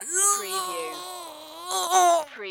Grunt Female

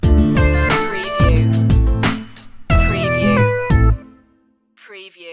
hip hop soul stinger

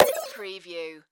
Device Button

